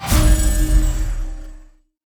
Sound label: heroes black Aegis cast; therien